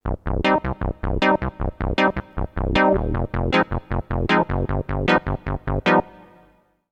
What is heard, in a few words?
raggae